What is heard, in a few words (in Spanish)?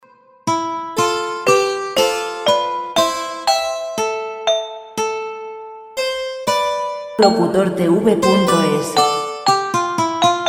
70 clasica